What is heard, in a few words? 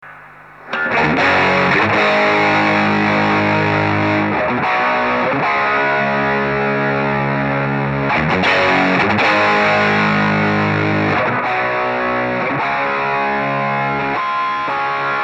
Perfect Volume, CLEAN DRIVE; SD-2 LEV5 TONE7 DRIVE8; after drive; Original Standard254; スタンダードタイプのショーモデル。